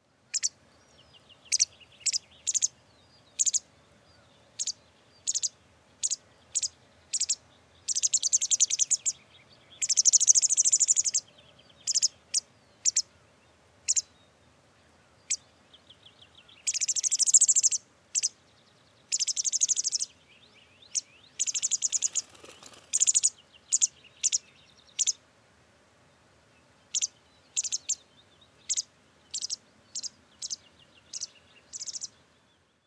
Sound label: Baltoji kiele White Wagtail - Motacilla alba